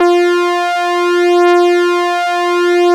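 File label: ARP lead F3; Sonic Reality; Vintage Timetraveler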